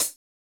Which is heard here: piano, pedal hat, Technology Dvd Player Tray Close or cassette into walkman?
pedal hat